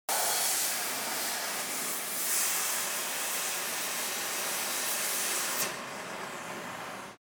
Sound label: burner